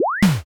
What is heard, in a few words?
cut-in